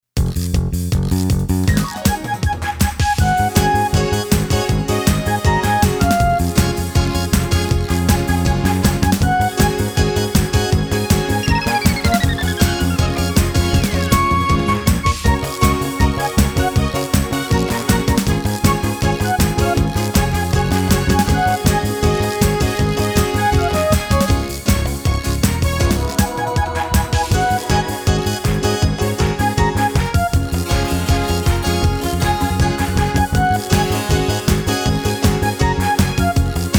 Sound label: Disco Bossanova